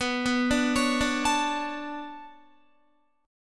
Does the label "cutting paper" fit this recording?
No